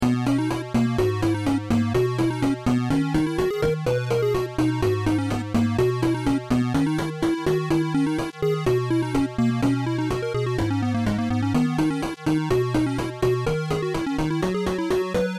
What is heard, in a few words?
8 bit